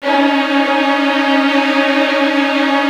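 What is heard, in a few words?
violin trills C#1; ILIO; Kirk Hunter Virtuoso Strings VOL-1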